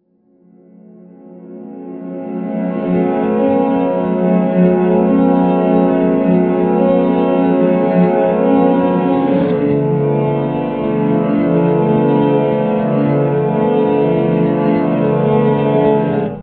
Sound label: IR reverb rev cathedral; Digital Audio FX